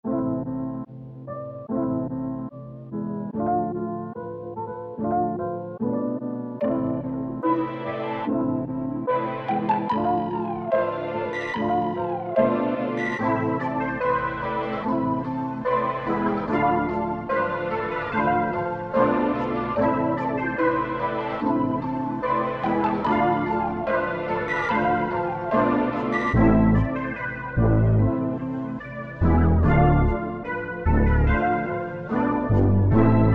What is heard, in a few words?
146 BPM